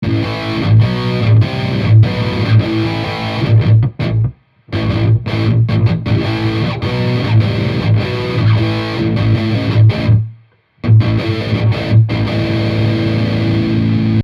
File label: distortion bridge; vgs soulmaster vsm-120 select sbm